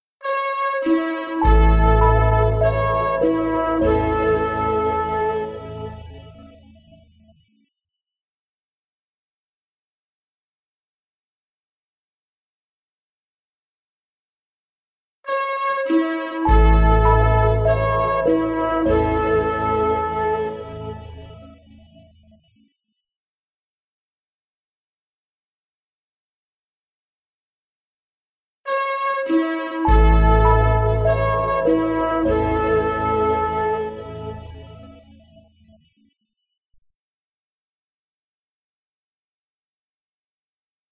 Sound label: andante posthorn; Rossini; Tell